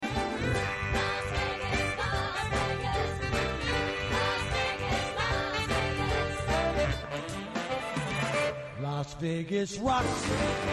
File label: jingle; LV Rocks